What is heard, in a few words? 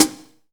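hi-hat 70s; E-Lab; X Static Goldmine 2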